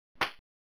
SFX; Fighter's History

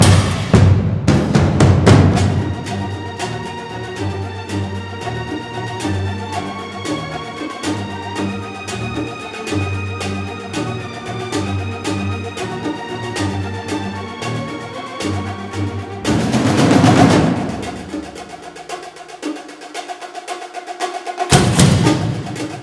String; The Bureau XCOM Declassified

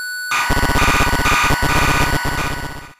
REC Noise; puchicon; dsi ds

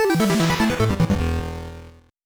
Pacifist Mode Failure

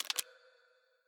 Main Menu Press

